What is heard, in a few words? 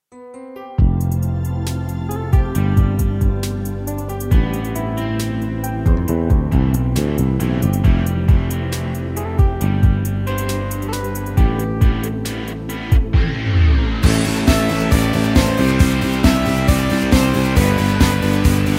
music